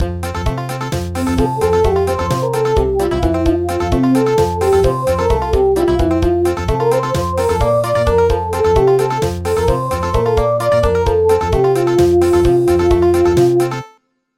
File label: Country; The Riera Heir